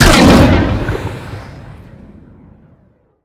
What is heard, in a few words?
illusion fear explosion